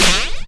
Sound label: Snare; Vengeance Samples